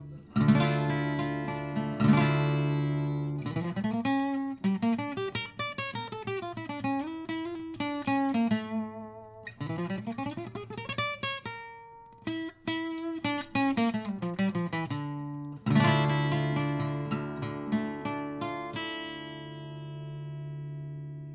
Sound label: M S acoustic HonuKulele